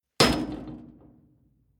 Household Door Metal Large Closing Impact Hit Ring Out; Metal Door Sound Effects